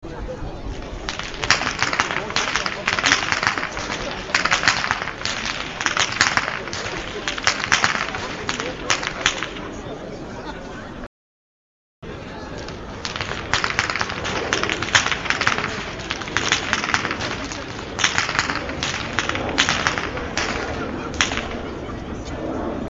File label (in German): schnalzen, jugend; oberteisendorf